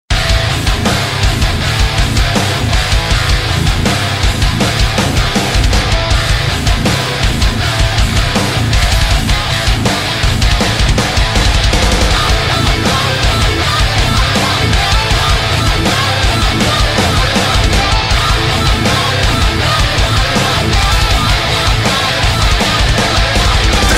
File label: Metal Diss Track